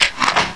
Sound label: buffalo breakopen, weapons; wanted